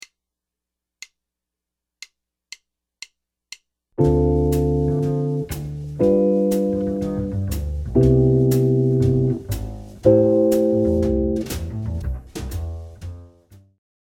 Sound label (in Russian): Dzhazovaya posledovatelnost akkordov